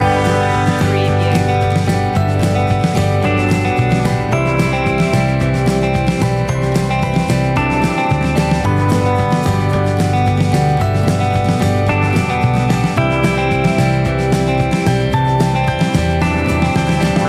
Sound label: that folky feeling loop